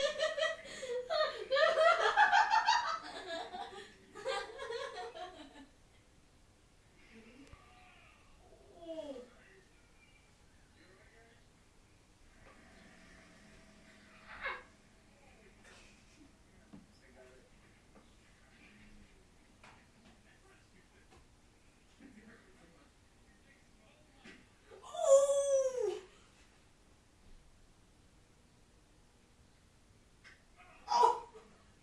son laughing

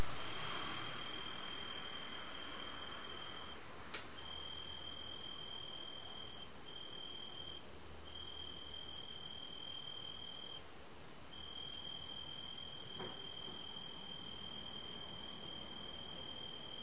cicadas at night

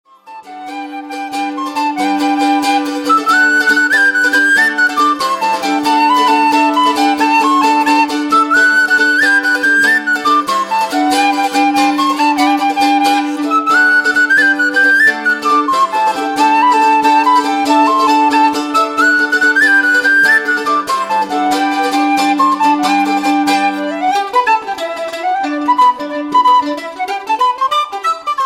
jig; old hag

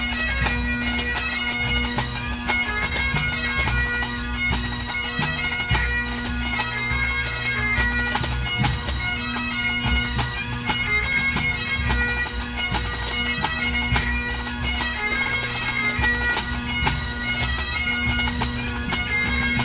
world pipe band championship practice

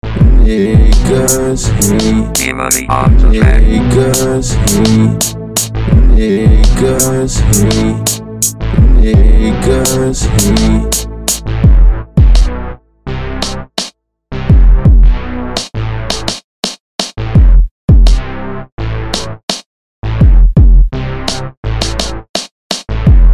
instrumental

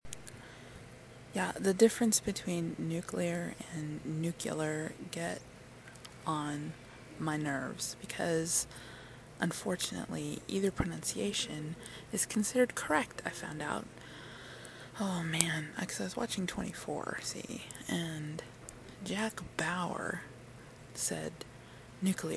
phew

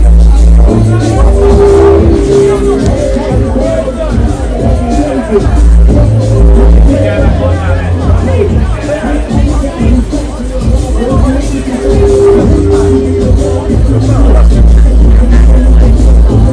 beats in reading

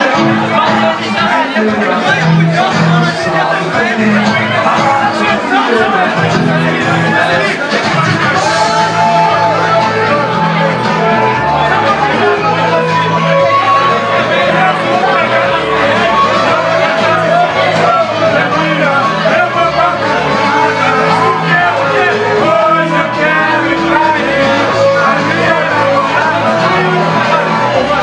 local beat

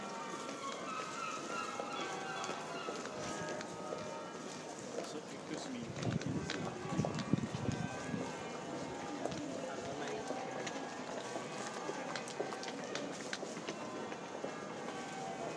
tuneful clock and gloucester gulls

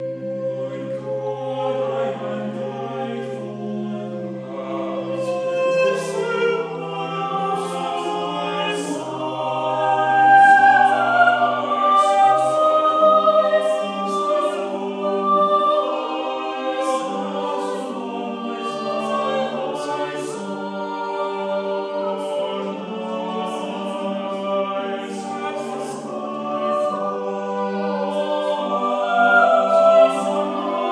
rehearsing; before bbcintune at national portrait gallery

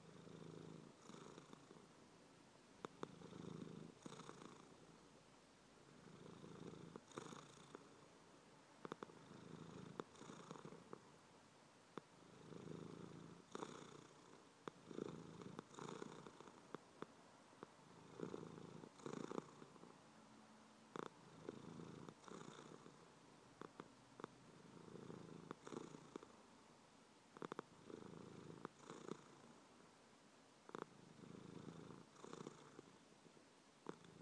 pure purring

